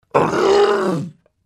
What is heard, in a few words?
alert; tes shadowkey